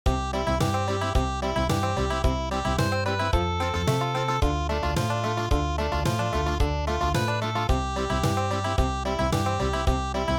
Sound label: Country